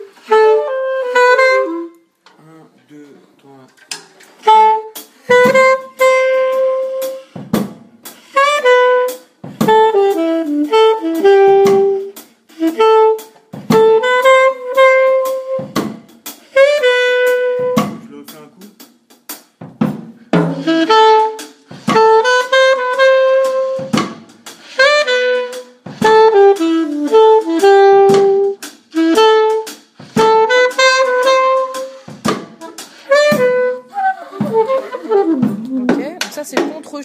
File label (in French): Cool reggae Flute Clarinette